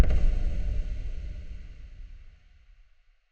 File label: CROWD SURROUND Light On; City SFX